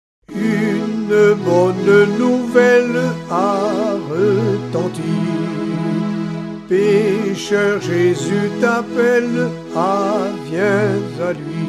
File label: Soprano, Cantiques